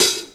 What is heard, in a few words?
HAT, Hip Hop